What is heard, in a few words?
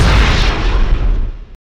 rocket fire, weapons